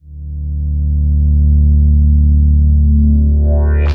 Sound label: Bass, Atmospheres; Akai; Mule